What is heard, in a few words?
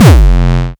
Oldschool Bass Drum